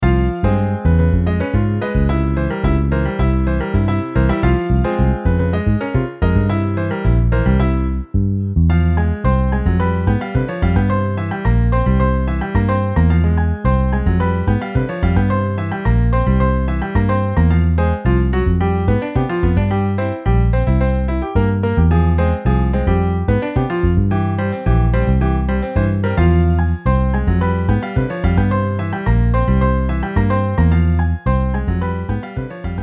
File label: Piano Bass MIDI